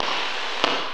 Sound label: STAT CLICK; Rarefaction - Poke in The Ear With A Sharp Stick